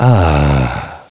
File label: AHH; Duke Nukem 3D